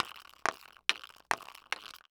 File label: Sports Hockey Puck Shuffling With Hockey Stick